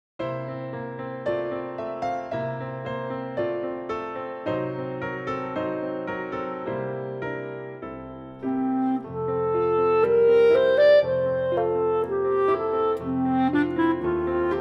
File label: Full Clarinet